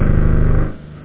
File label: horn, rave; da capo vol1